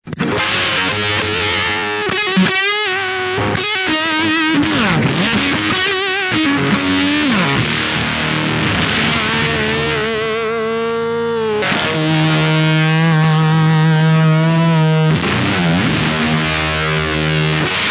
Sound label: earwig splat